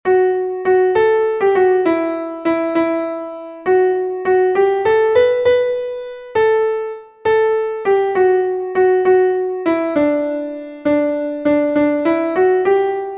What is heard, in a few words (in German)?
klavier; MelodieMeister